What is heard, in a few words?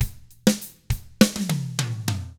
ROCK, Drums; ILIO; Double Platinum Drums 1